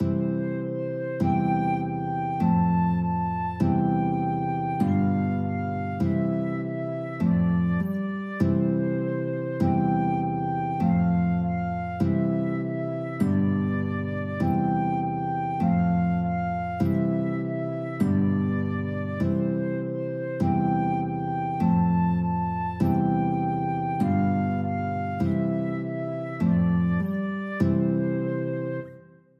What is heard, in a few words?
twinkle twinkle FL GUIT duet, flute guitar duet